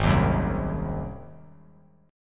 Ab 7th Ab down; Ab7 strummed; JGuitar

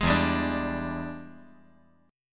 E 7th Sharp 9th, chord

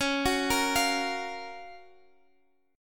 Gb Major 7th Db, guitar

